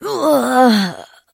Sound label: trader death; 7D2D